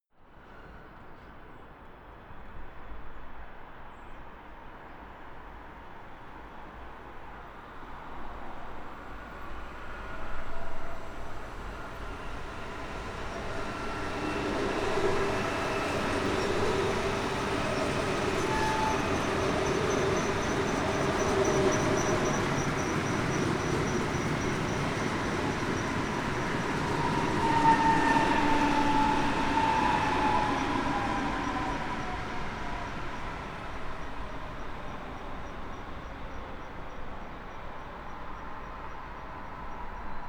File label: train city multiple passing